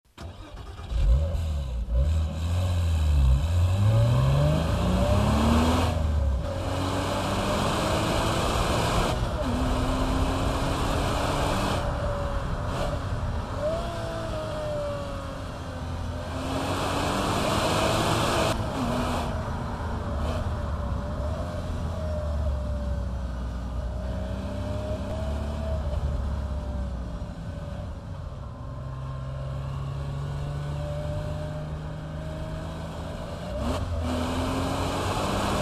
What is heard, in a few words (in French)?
boxster S a bord; porsche